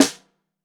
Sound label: SN Bottom, Snare; Ableton Foundation FreePacks; Snare Designer